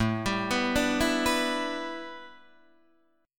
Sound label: A 7th Suspended 2nd Suspended 4th Guitar DADGAD; 7,10,9,7,7,9